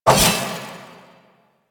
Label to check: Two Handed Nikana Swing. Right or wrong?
Right